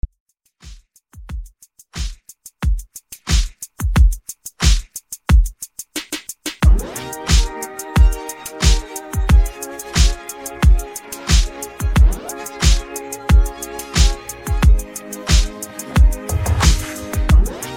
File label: Top40 Mixshow Intro 90 Bpm